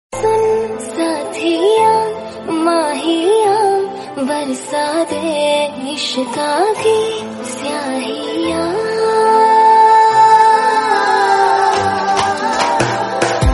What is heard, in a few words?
Romantic Ringtone